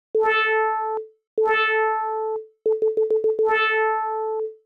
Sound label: opl2